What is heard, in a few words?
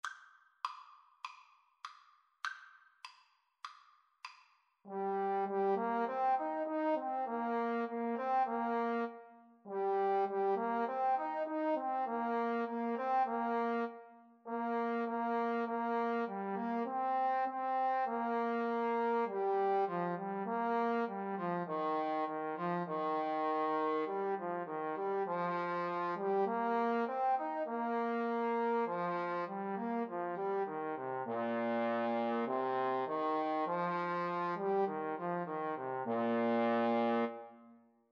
Trombone Duet